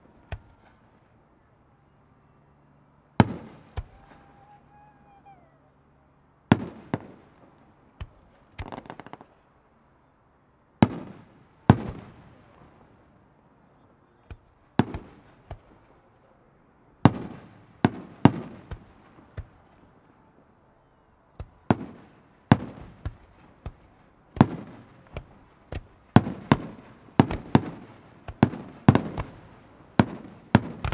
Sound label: fireworks; Ambisonics